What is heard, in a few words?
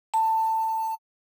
Sample Vibraphone C3; Pigments